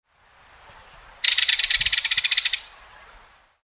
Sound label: belted kingfisher